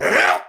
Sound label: Attack Effort, Battle; Warframe; Tau; Dax; Knight